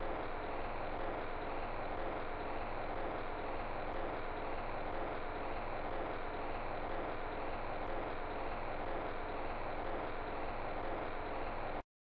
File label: autoplay Spin; netent; reelrush